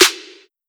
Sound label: Clap